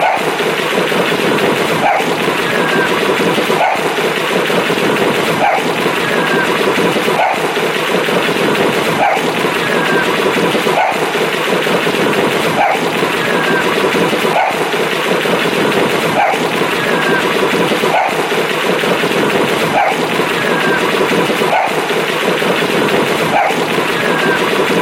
cluub, chants

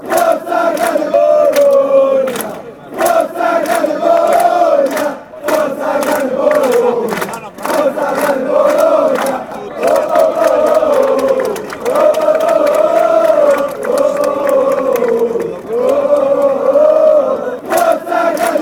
great fanchants

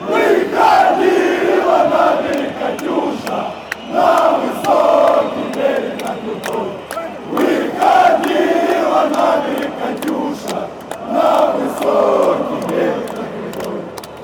rhythmic, fanchants; russia

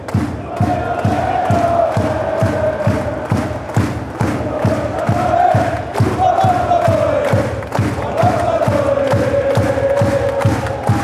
super deutschland ole, fan chants

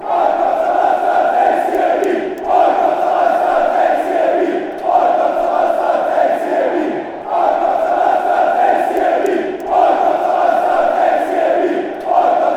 chant, fanchants; fc schalke

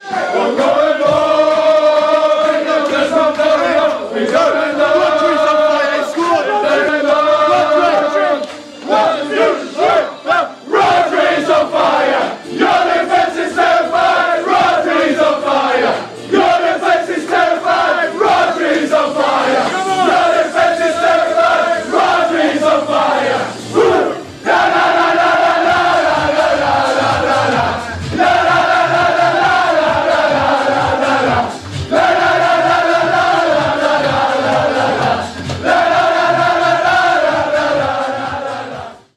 on fire, player chant; rodris; manchester city

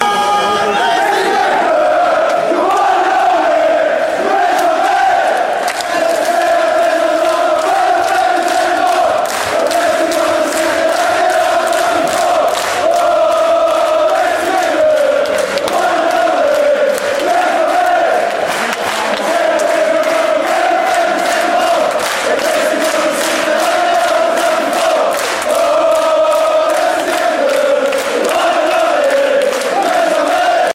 oh, fanchants; racing mechelen

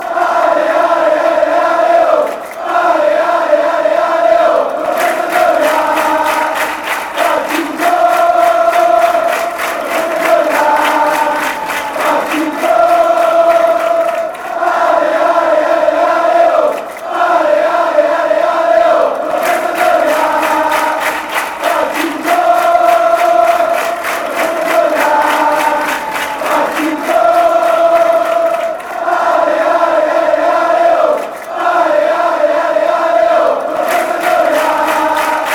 ale ale ale aleo, fanchants

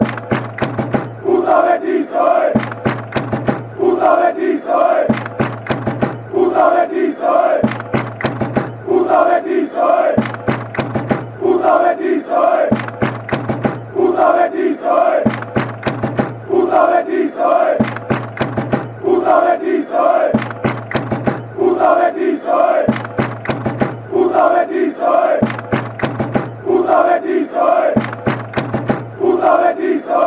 betis, fan chants

